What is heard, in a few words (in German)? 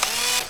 BOHRER; Best Service; Advanced Mega Effects